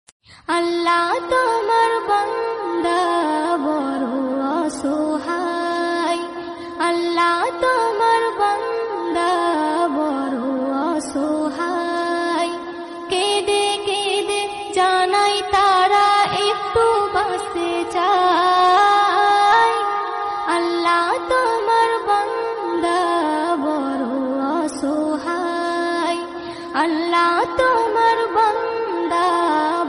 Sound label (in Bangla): gojol bangla islamic gazal